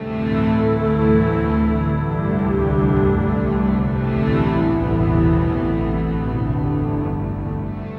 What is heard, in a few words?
Acoustic Drone, Long Loops